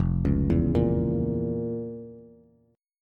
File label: F Major 7th Suspended 4th Sharp 5th F, Bass; FM7sus4#5 arpeggiated; JGuitar